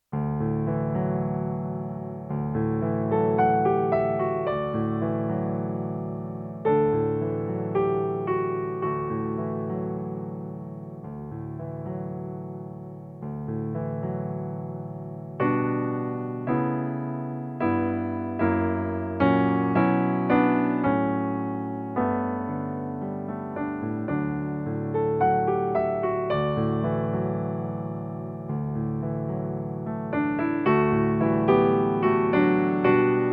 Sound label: beginner 110 BPM, violin; Aladdin